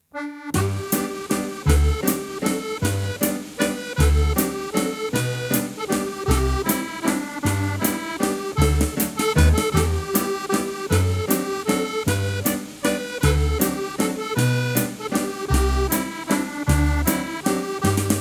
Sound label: Waltz, ceilidh band; Oran Mor; Troon, Ayrshire; accordionist